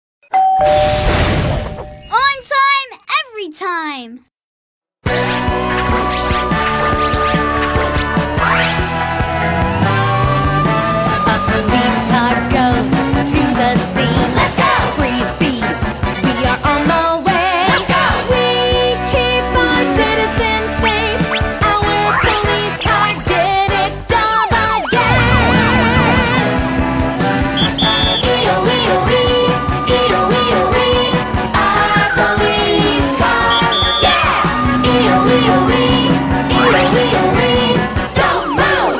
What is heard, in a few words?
Police Car English Song, Songs for Children; Gabby; SuperWings